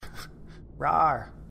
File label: rawr